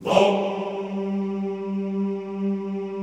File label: VOHMMM G2, Classical Choir; Best Service; Extended Classical Choir